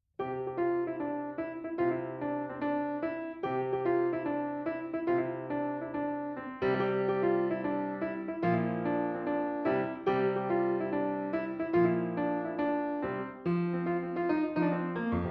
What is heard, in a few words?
jiggling fairy; piano